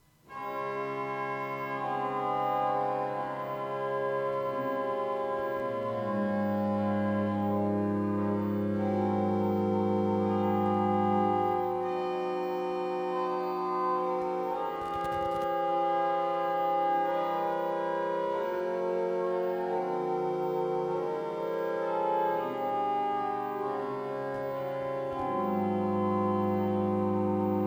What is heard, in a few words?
viola de gamba 8